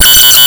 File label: open window; altes Haus; alte Haus 2